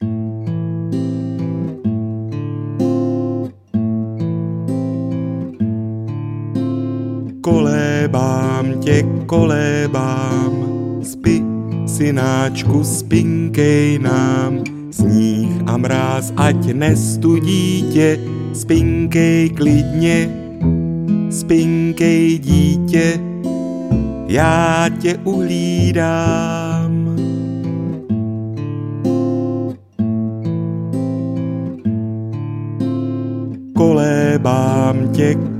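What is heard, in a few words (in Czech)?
ukolebavka, lullaby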